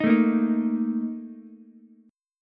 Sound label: A sharp Major 7th Suspended 4th, Ukulele; strummed; JGuitar